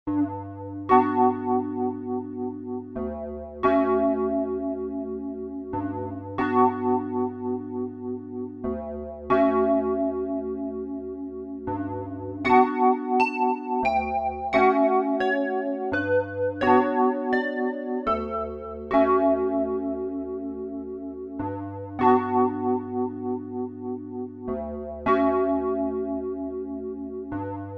Roland MKS-10 e piano flanger tremolo

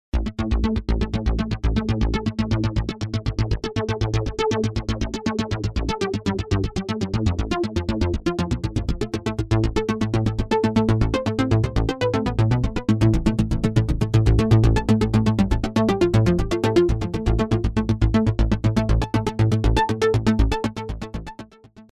Kaox Arp

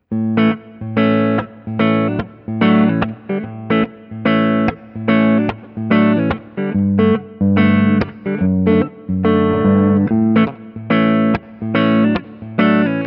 Fusion T HH Humbucker Clean; Harley Benton

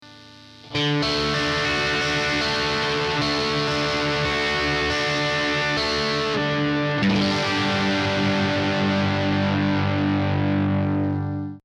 Steinberg UR816C Lead

